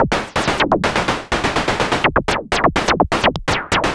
SH101 Clocked LFO Rhythm (125BPM)

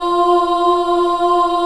F3 CHILD OH, Choir; USB Soundscan vol.28; Acoustic & Synth; AKAI

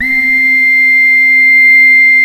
beginloop, synth; Keith Emerson Signature Series; BEGINNING

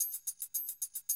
110, Classic Drum Loops

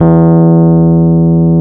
E 0, Electric Pianos; Wizoo Electric Pianos

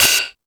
High Hat, E-MU Pro-Cussion

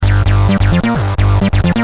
Driven, Bass; Industrial

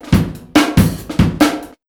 Big Beat Drumloops; RUN 140; USB Soundscan vol.25; AKAI

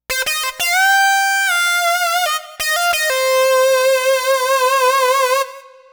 Lead Synth Lick; Riffs & Solos; Dr. Fink's Funk Factory

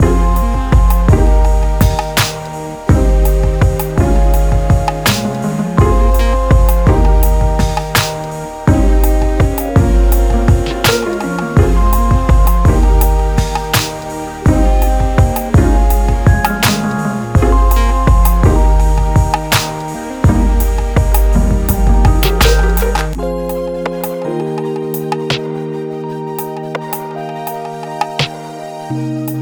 083 Fmin, Smooth RnB

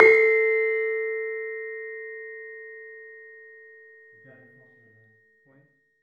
LAMEL A3 -R; USB Soundscan vol.60; AKAI; LAMELOFO

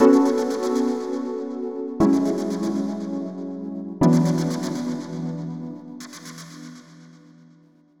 Synth loop (120BPM); musicradar

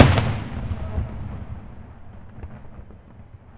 FIREKICK, AMBIENT DRMS; Spectrasonic Distorted Reality 1

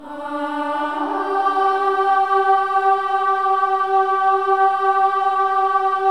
INTERVALS, Symphony of Voices; Spectrasonics

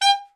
ALT SRT, ALTSAX SHORT; Giga Samples Collection

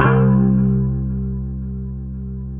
EL GUITAR C2

